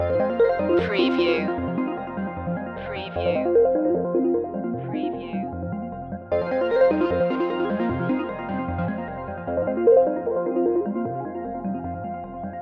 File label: information age loop